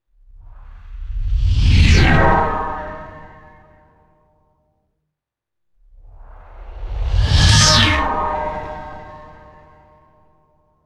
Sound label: synthesized sci fi tubular tonal whooshes